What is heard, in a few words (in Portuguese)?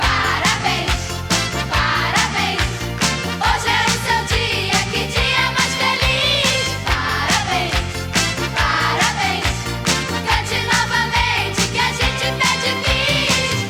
parabens